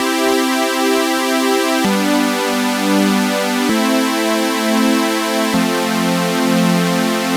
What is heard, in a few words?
chord